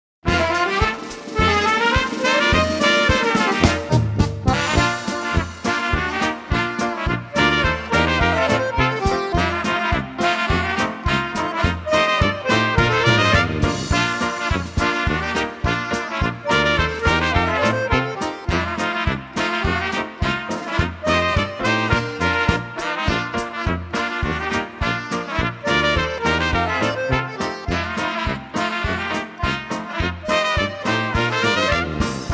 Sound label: Polka